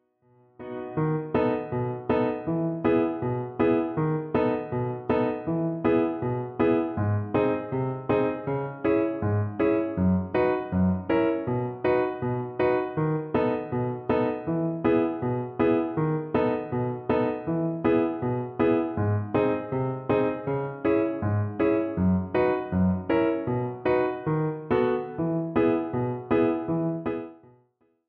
spiccato obligato

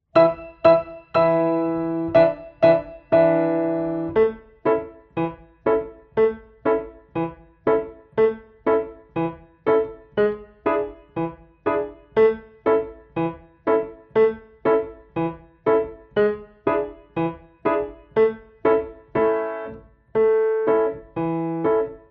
playing pizzicato

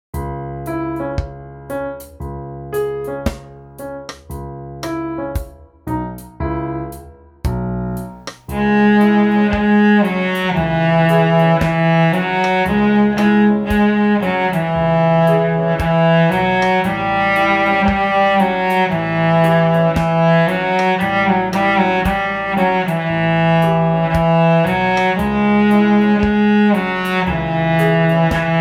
seashore reggae

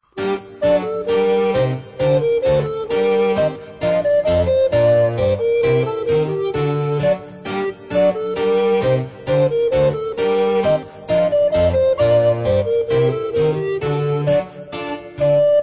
Gavotte

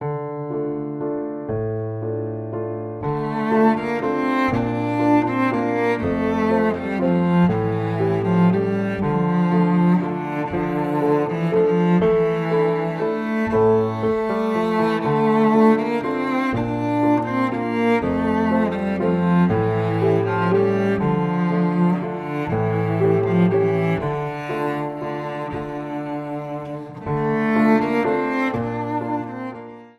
Waltz

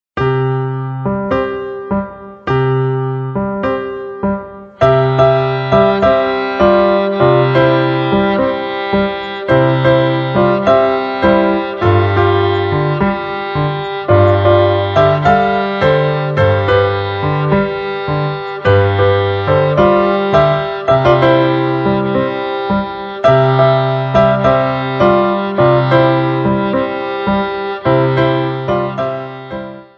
Tango

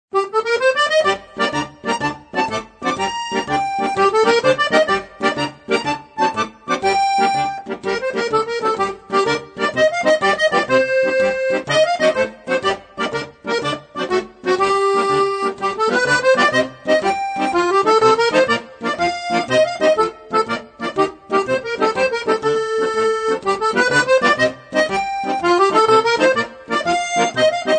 Tarantella

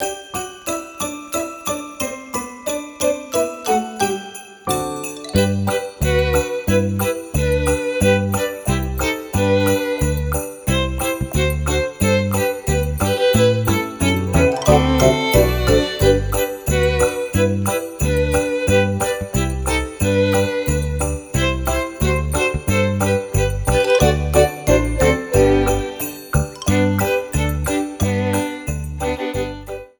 jingle bells

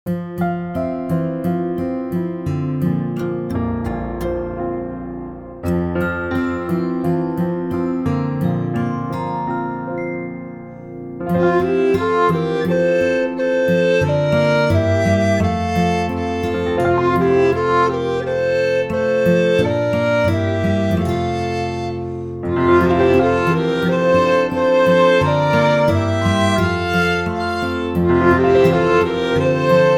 Gavotte

